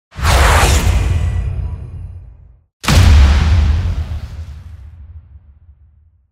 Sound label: Trailer Tools Whoosh Hit; Production Music; CATastrophic